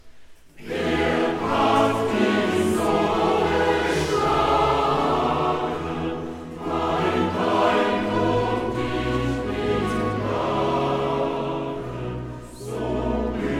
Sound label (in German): Choral; Wer hat dich so geschlagen